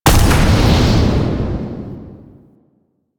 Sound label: Ground Slam Hit; Warframe; Veilbreaker; Sprag